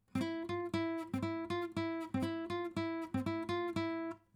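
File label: acoustic pattern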